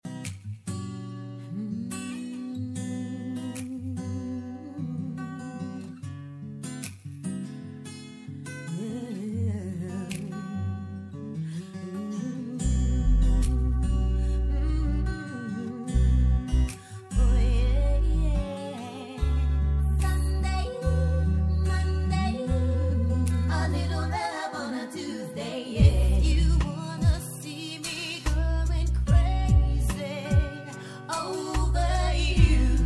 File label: pop before